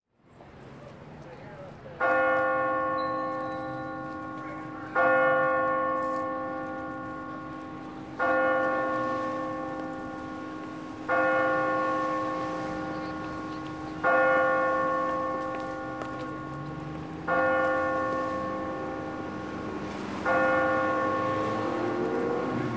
City, traffic, pedestrians, clock tower, 12 tones of the bell; watches